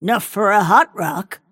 last hit pain; snapfire

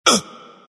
embr pain; dota; ember spirit

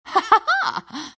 lina laugh; dota

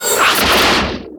electric vortex cast; storm spirit

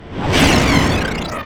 death; dota; enigma

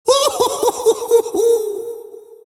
crown laugh; dota; monkey king

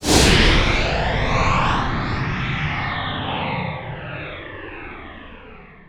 dagger cast; spectre

